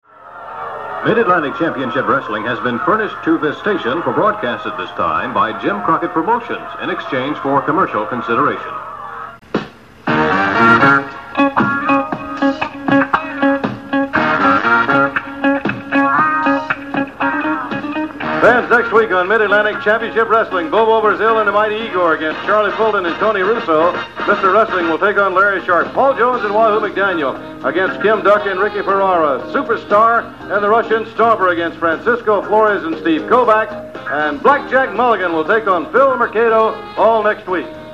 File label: Closing Theme; Mid-Atlantic